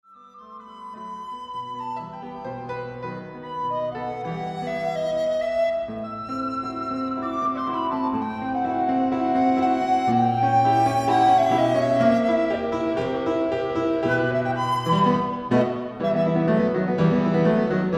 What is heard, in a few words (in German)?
Rondo E-Moll